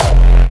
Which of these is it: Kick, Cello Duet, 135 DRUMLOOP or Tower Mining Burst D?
Kick